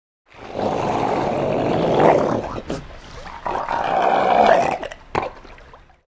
Seal, Harbor Snort, Growl. Single Snarl, Growl With Gargling, Water In Background. Close Perspective.